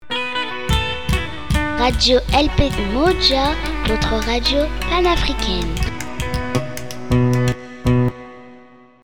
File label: Jingle